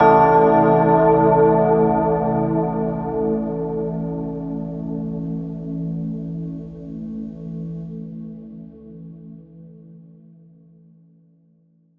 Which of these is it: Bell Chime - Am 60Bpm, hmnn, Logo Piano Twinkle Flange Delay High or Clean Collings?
Bell Chime - Am 60Bpm